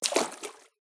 walk in water; Mommy

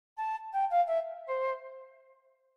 Flute - Melody

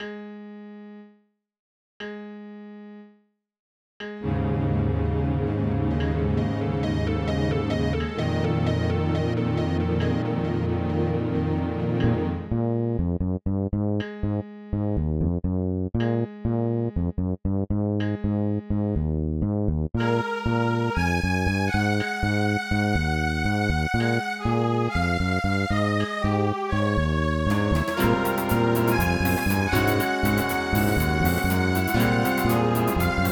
Roland SC-55; SimCity 2000

